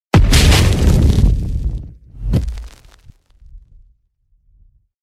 Explosion

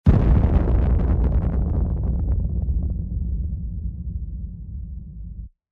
Explosion

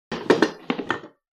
wood material

